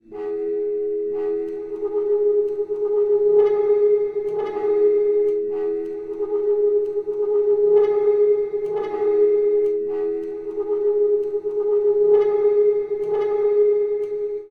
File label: Scroll Diapason; Luxuria Superbia; Android iOS Linux macOS mobile Windows gamerip 2013